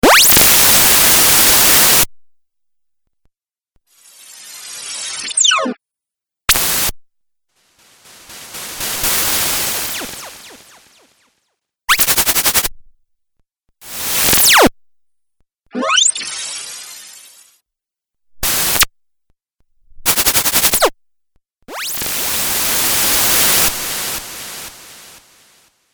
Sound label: Laser Hiss Video Game; Video Game Sound Effects